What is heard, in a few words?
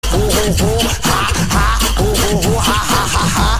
ho ho ho ha ha